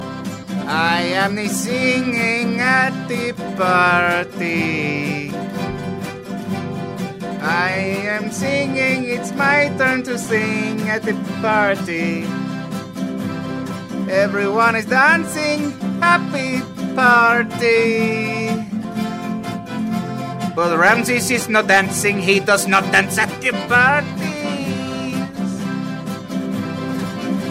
Singing At The Party

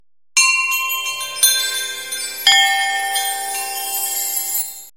Temple Bell

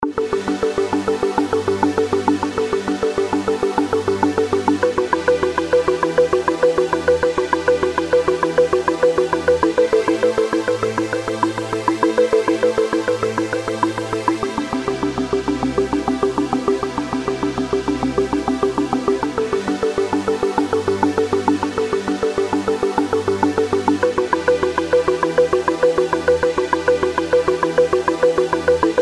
Sweet Melody

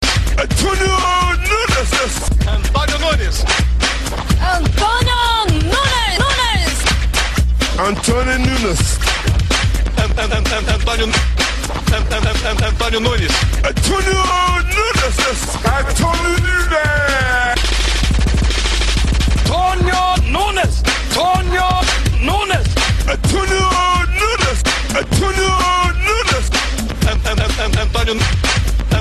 Funk

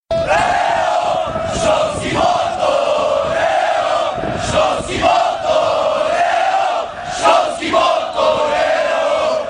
Motor Eeeoo; Slask I

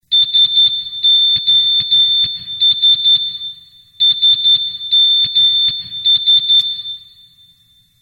Morse Sos Sms

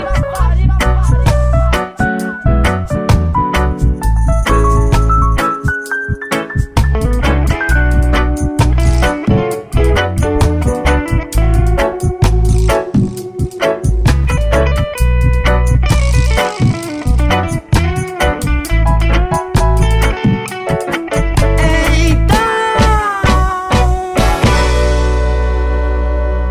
Reggae

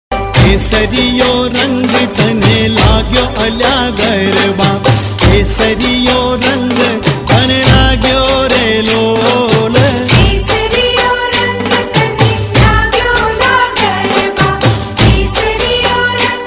Garba

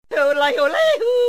Yodel; Vega

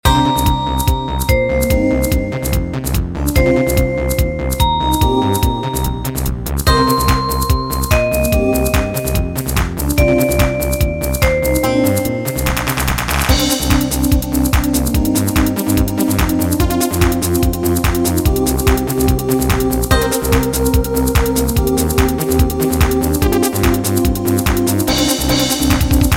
Hard Trance

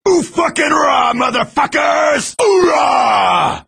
Oorah

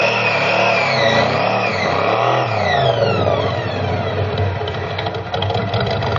Supercharger

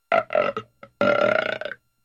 Burps

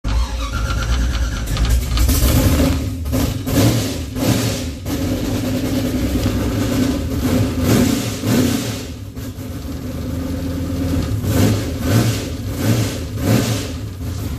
86 chevy first fire

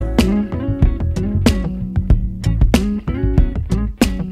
riff

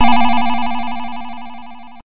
Coin; Wonder Boy

